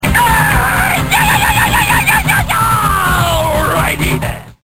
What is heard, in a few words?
aiiieee